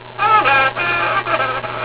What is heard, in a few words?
haha